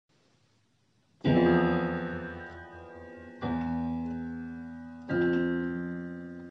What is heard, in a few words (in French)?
demi ton